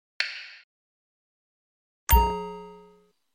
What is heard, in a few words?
notification; gmod; paris